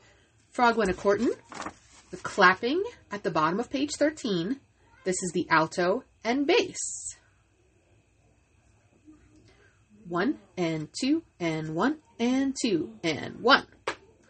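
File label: frog courtin alto bass; study tracks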